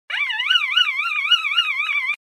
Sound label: Roll On Squeak